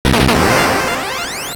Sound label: explosion; famiconse